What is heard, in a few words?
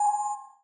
beep, Button; Barotrauma; Subsurface